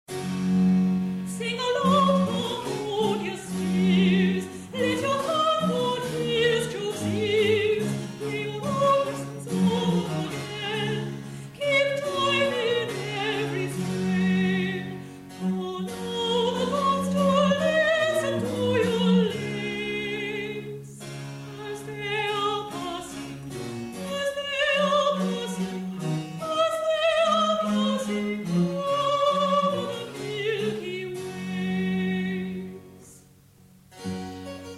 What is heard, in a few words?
sing aloud; Anon (mid 17th cent.); verses 1 and 3